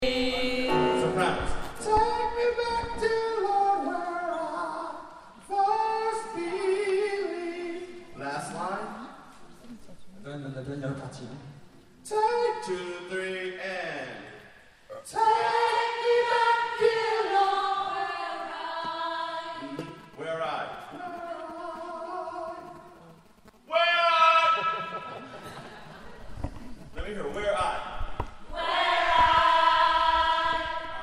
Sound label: soprano